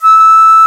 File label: Venu E5; Planet Earth; Digital Sound Factory Planet Earth